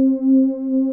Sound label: ANALOG PAD C3; Music Store - Claudius Diemeter Schwerlos